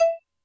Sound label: Xylimba, Alesis D4, Drum Machines